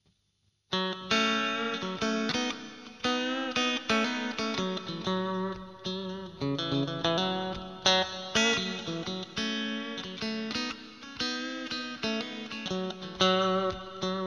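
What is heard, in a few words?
Bridge Clean Jazz Chorus, vp strat56; Neck 2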